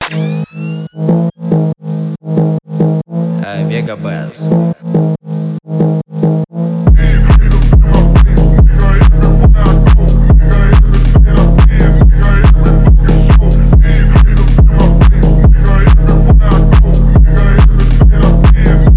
PHONK

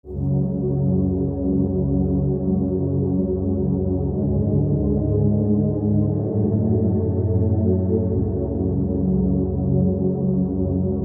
Instrumental

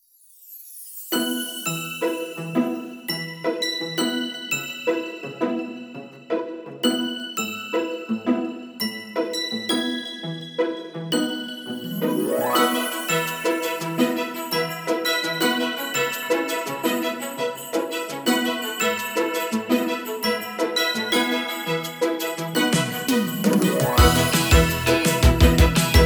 Fugue

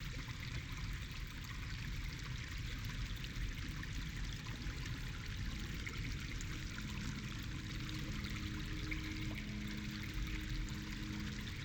Spa Music Heavy Rain Sounds

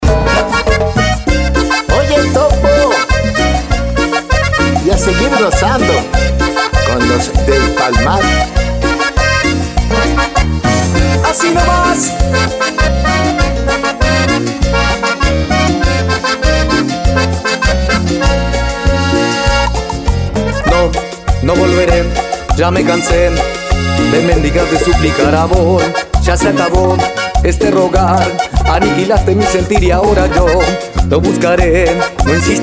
Cumbias

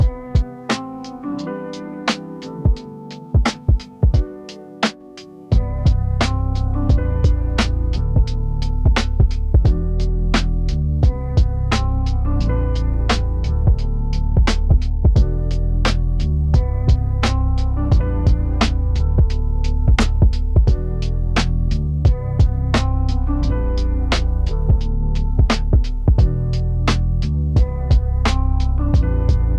Beats

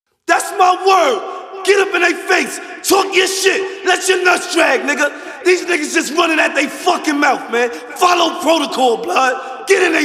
Low Bass 33-35 Hz

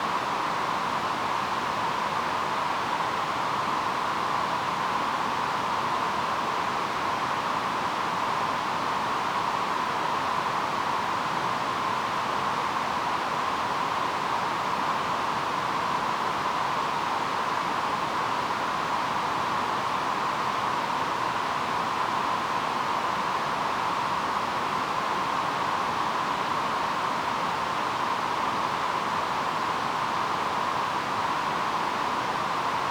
Rain Sounds Sleep, TV Noise

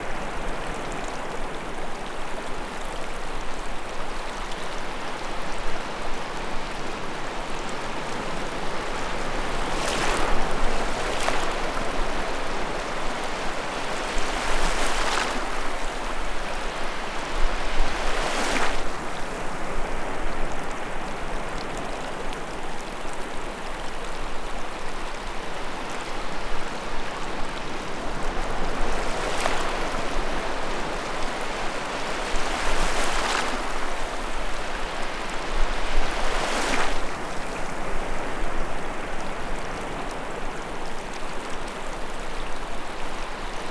Tidal Waves Feet in the Water; ASMR

